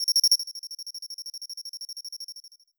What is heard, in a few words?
insect chirp synth high